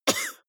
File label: cough male single soft short; soundpool